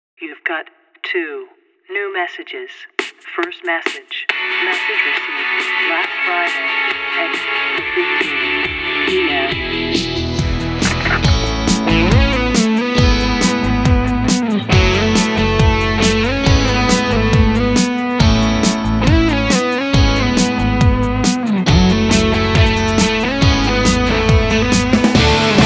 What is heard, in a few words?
guitar spoken